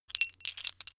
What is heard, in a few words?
metal pieces clatter short